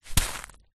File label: leisure juggling bean bag small catch in hand and squeeze; zapsplat